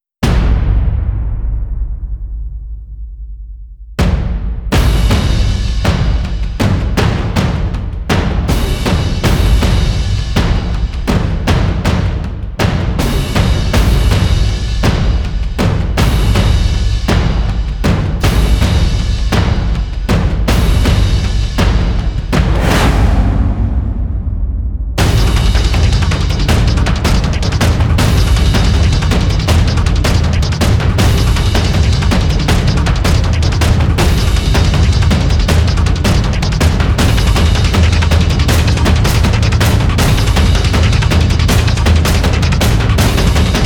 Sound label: drums